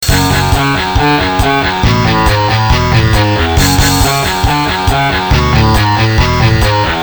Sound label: depressing rock music